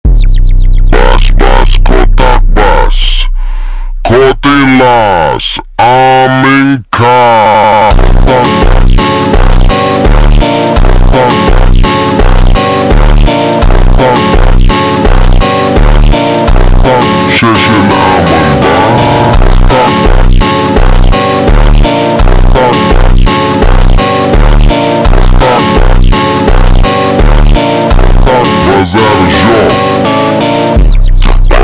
Funk ultra Slowed

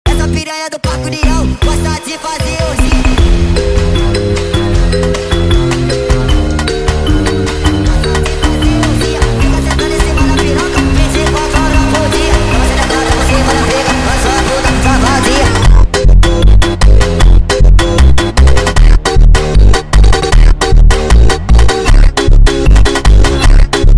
Funk sped Up

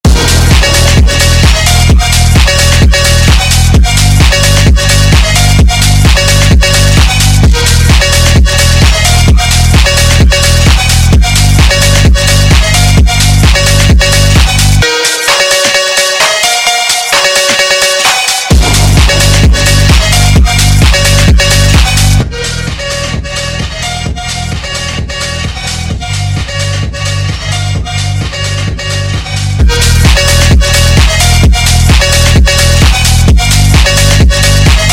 phonk; Skibidi Toilet

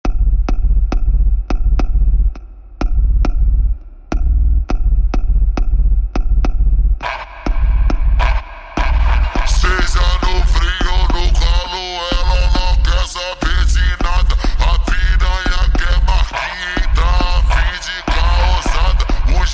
Risada Maléfica Infernal slowed

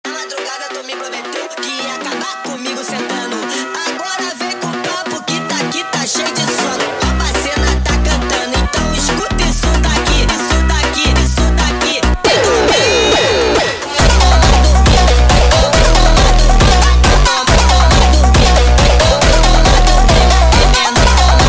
Montagem sped Up

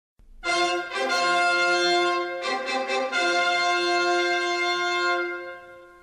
fanfare, ringtones; Disney

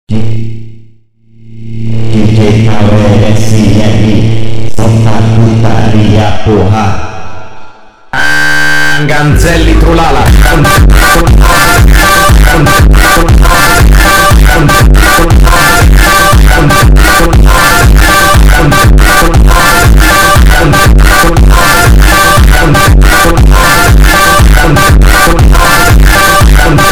FUNK superbass reverb slow

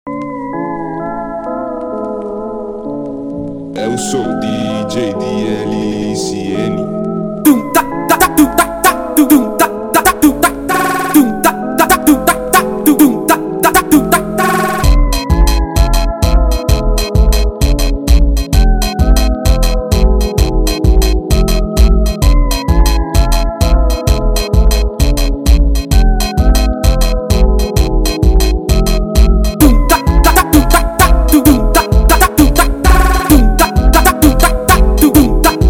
Funk

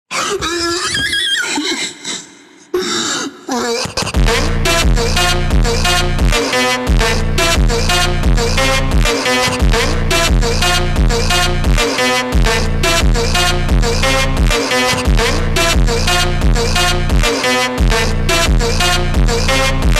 Funk slowed

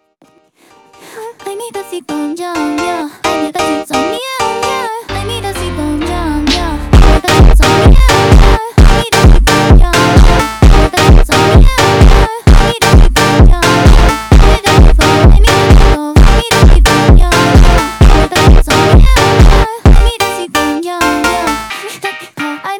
Funk

